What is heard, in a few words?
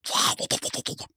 startle